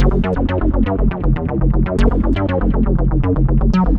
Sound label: F#1, Synth Line; 90 s Sample CDs; NiceBeats; SynthLine VOL-18